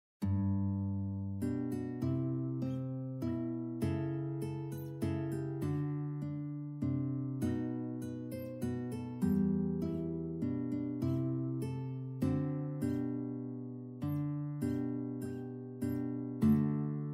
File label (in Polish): We Wish You A Merry Christmas Gitara; bez tabulatury